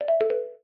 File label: notification silent oneplus 5